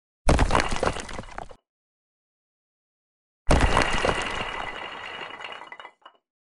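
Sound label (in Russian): bricks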